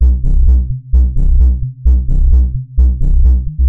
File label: 129 bouncy distorted bassline